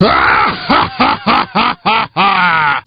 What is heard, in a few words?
evil laugh; Wizpig